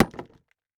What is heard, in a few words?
Impact Debris Large Plastic Hit By Small Metal Pipe